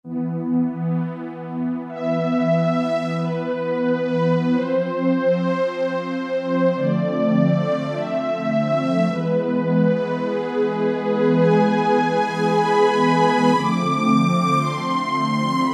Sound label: HORN STRINGS, Casio VZ-10M; Internal factory combi